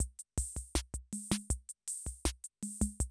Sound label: CR78, DrumLoops